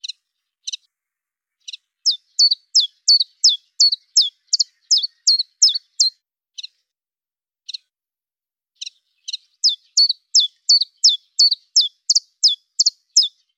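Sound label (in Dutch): tjiftjaf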